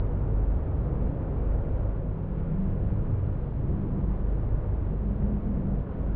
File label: inside amb; push